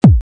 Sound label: kick